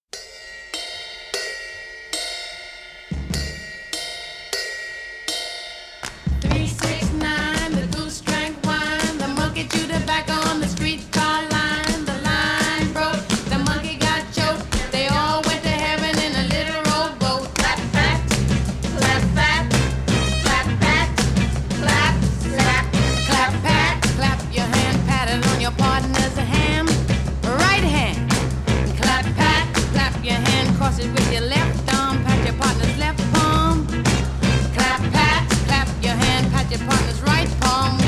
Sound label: (Clap Pat Clap Slap)